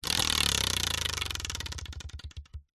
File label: leisure wheel of fortune wooden short spin; zapsplat